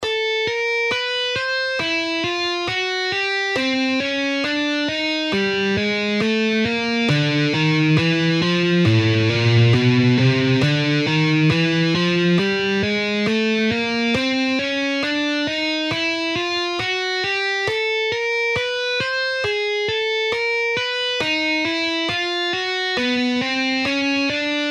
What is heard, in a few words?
guitar finger exercises